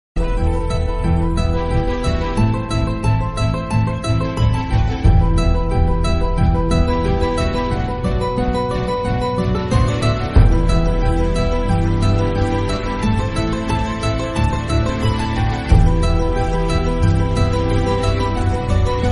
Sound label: telugu ringtone south ringtone love ringtone